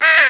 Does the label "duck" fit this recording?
Yes